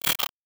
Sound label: error tone